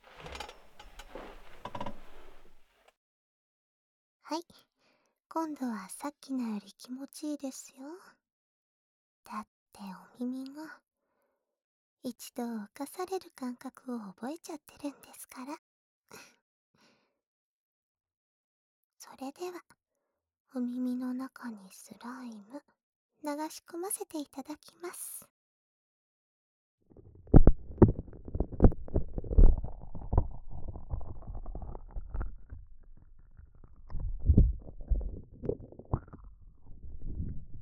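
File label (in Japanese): スライムお耳・左耳; メイドさんのスライムお耳レイプ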